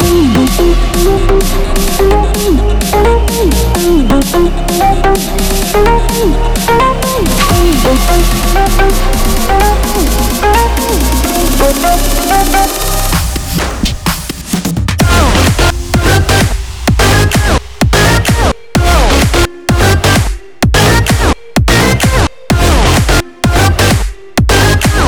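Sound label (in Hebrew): באונס חזק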